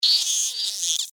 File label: Insect Voice Pain, Insect, Voice, Pain, Vocalization, Bug; Sound Effects:Animals:Insects; Blastwave